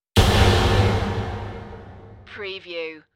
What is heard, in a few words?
Velocity Crash